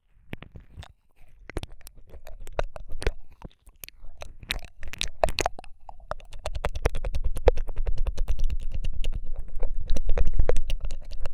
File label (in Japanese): ぴたぴたくちゅくちゅ耳舐め, ASMR